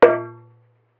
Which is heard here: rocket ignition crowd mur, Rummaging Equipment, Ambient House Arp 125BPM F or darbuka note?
darbuka note